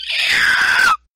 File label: screaching